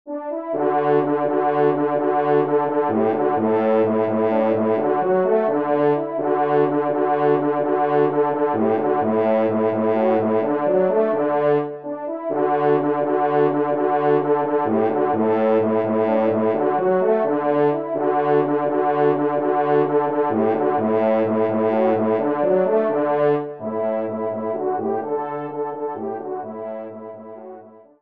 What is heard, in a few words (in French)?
marche, trompe; Marche de Venerie; Auteur : Jules Millescamps